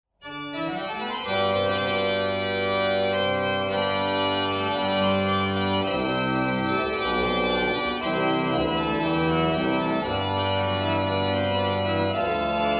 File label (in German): praeambulum in F, orgel; jacob praetorius